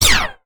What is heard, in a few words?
Space Gun